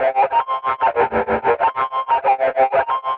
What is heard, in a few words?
TUVAN PULS, Synthy Tonal; Distored Reality